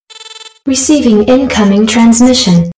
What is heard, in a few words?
sms alert terran; ringtones